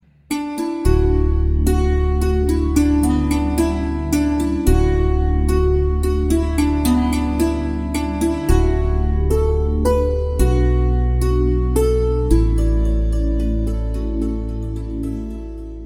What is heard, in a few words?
Melody